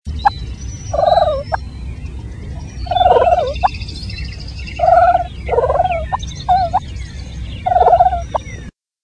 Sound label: Purr, turkey; wildlife